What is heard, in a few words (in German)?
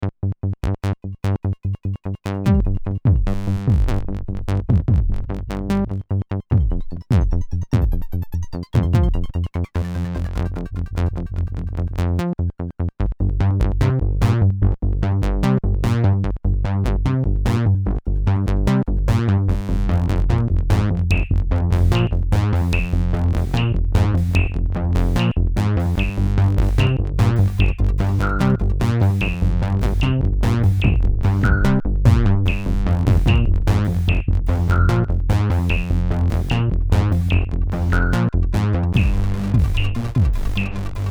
Jupiter 6 Alte Schule; roland